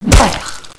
claw strike

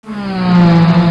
crash

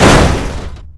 truck crash

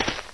step

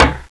bullet ricochet metallic

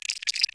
hunt, Snark; Half-Life

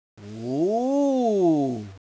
ooo

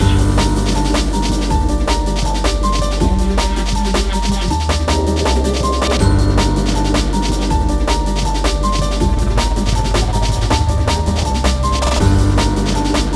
jukebox

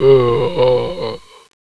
arms lunge, zombie

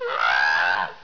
monkey

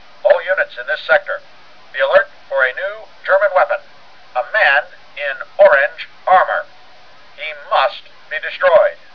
alert

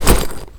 melee swing

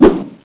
bear claw

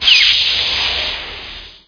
door

